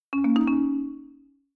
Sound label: New Message